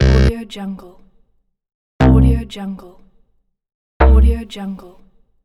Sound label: error